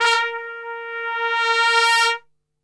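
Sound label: A#2 trumpet; Best Service; Brass Super Section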